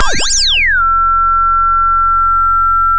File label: pop, sound of a bike bell